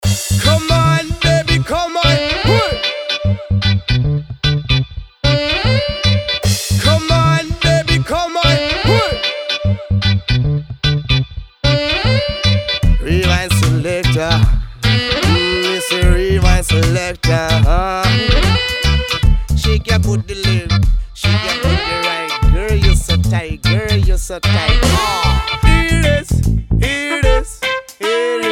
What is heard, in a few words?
reggae